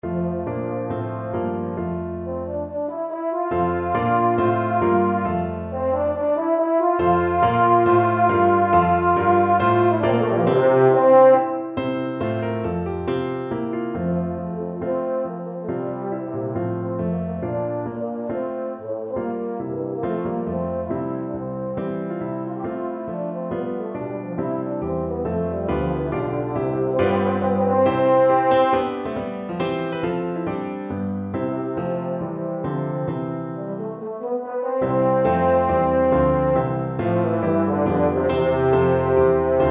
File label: euphonium